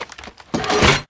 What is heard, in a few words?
reload canister out; wpn archer; Titanfall 2